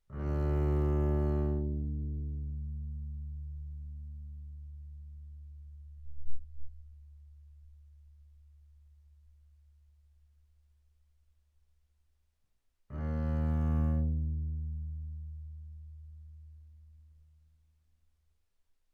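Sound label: Bass arco sul D fortissimo D2 B2, double bass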